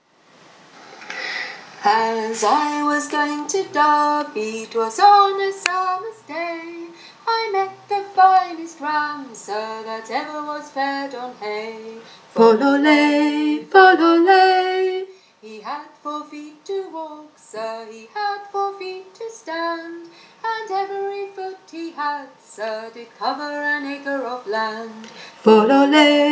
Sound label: Harmony high with melody; The Derby Ram